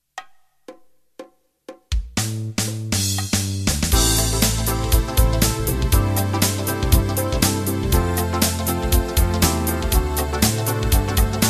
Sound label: Fusion Intro